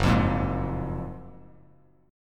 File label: Gb Major 9th, down, Bass Standard